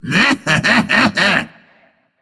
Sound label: Tongue; smite; hades